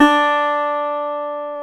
GTR, Dobros, National

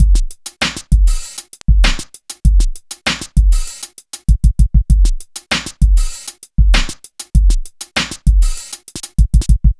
DOWNTEMPO BEATS; Sonic Foundry (Sony Creative Software) - Future Retro Dance Excursions

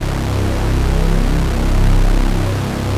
BIG PAD; KEYS AND GUITARS; Synclavier Sampler Library

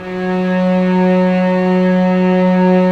STR, Vcs p Amb

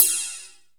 FX Cymbals, Percussion; Dance Synths

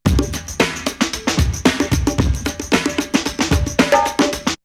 Drumloop; ZERO G - Datafile DISC 2

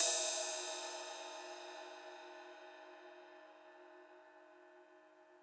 Ride Cymbal

